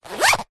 foley bag leather small handbag or pouch front compartment pocket zipper; zapsplat